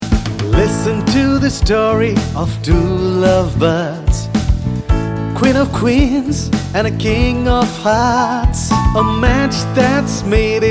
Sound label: WEDDING WISHING SONG ENGLISH MALE